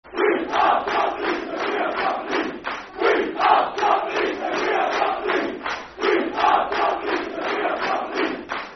We Are Top Of The League Football Chant (Soccer Songs); Dagenham & Redbridge Drfc